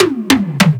Moog Toms

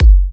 F# kicks; markus hakala kicks

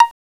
PIZZ HI, Fairlight CMI, STRINGS; STRINGS3

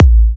Kicks A; markus hakala

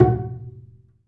Bass Piz G F#3; Digital Sound Factory Virtuoso

